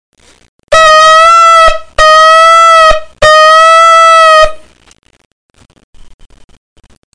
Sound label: shevarim